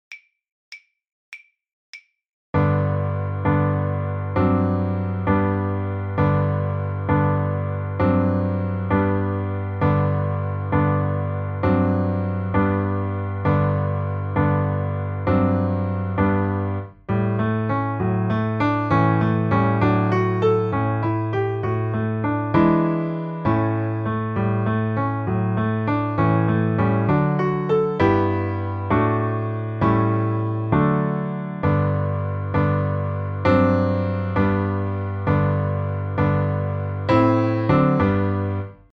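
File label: Green Gravel accompaniment, violin; The Violin Fun Book (Level 2)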